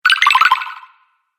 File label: Fantasy Game Collect Sound, video game